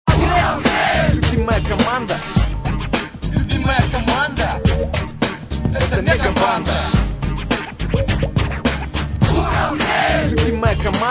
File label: rap